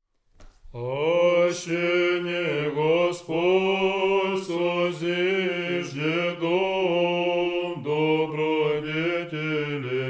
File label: second antiphon tone 8